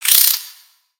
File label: Spider Attack Ranged; Lego; creatures mechanical